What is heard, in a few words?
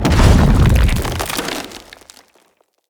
Knight Spawn Burst; Enemy; Characters; Abilities; Mendicant; Mnd King